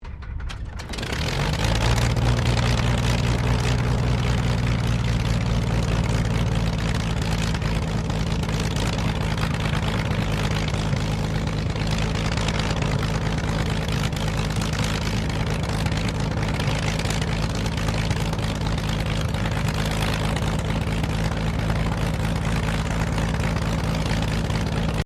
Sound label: AIRCRAFT CT4A STARTUP IDLE, RAAF CT4 training aircraft; Airplanes; Vehicles